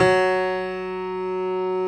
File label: F#2 STR PIA, G.PIANO; AKAI S-Series CD-ROM Sound Library VOL-7